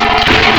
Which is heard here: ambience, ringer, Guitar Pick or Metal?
Metal